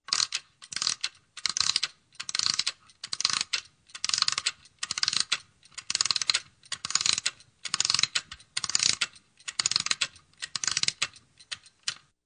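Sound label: Budilnik zavodyat, alarm clock winding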